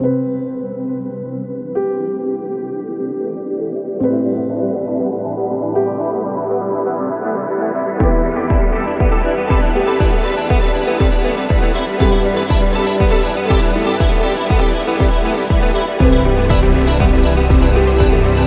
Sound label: Ambient Cinematic; epic action